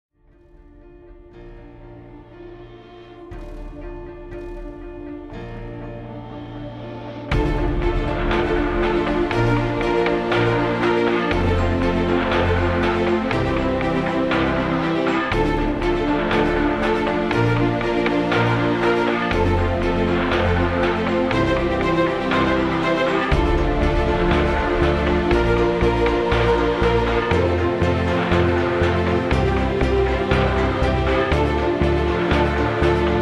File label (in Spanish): musica epica con trailer; trailer de assasin creed